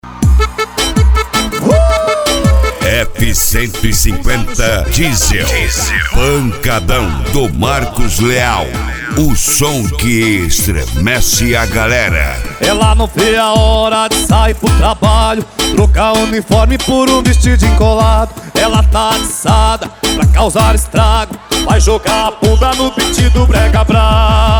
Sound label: pancadao